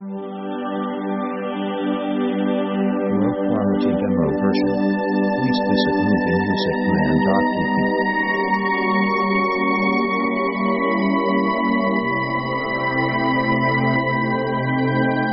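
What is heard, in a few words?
synth orchestra style ballad; synth themes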